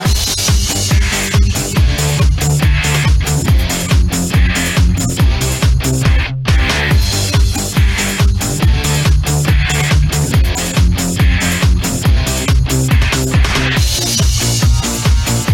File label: background